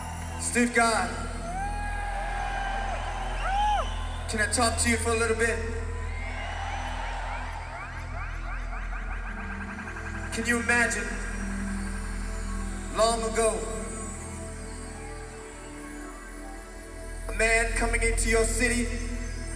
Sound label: Instrumental